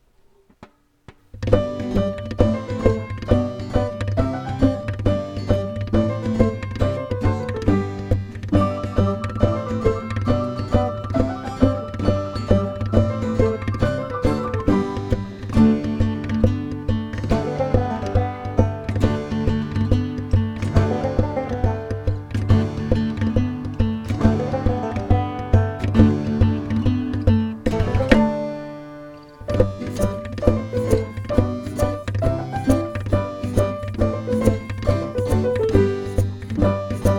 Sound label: Folk Market Theme; Game Folk; OoT